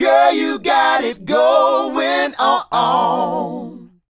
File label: Male Group, Hip-Hop and RnB Vocals